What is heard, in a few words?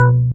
Bass Vibe